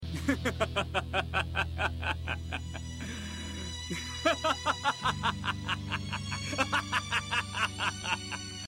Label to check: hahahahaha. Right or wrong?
Right